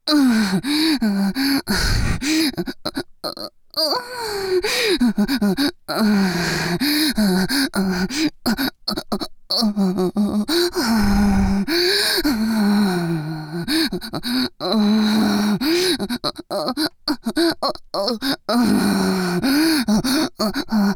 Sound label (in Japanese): 右 喘ぎ, ASMR; ギャルフェラッ!!学校は援交ギャル風俗店ッ!!